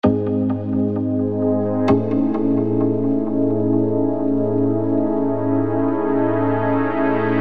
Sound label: KEY Nostalgic; sickrate; siik essentials iv